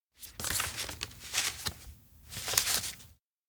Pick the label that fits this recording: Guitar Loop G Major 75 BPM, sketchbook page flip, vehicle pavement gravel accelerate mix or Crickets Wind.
sketchbook page flip